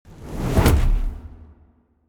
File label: swoosh